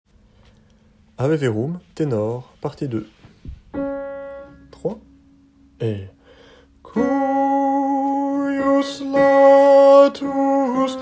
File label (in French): Ave verum tenor; Mozart; Ave verum P2